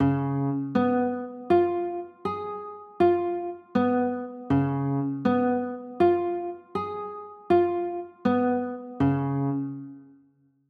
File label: d flat 7